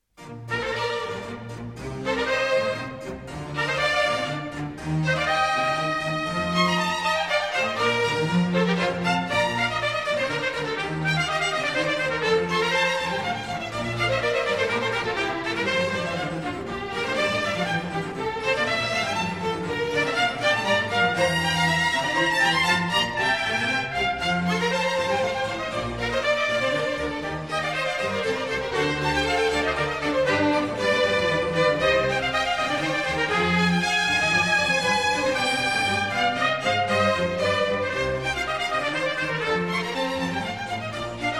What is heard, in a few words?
Concerto for Trumpet; Georg Friedrich Haendel